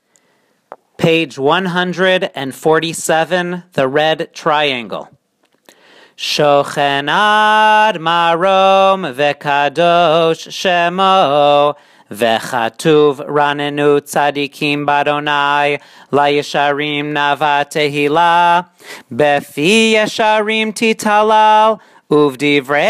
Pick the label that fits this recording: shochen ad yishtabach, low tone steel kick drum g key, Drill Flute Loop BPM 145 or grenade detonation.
shochen ad yishtabach